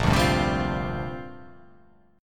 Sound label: Bb 6th Add 9th Bb; Bb6add9 strummed